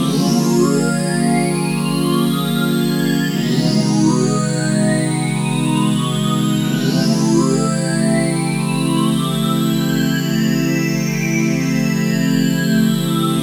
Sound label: Pad 143 BPM A#, Club Techno; Zero-G; Groove Loops